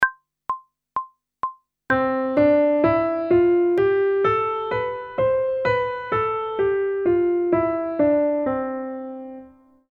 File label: Major Scale one octave ascending and descending, pop vocals; grade 6; Technical Work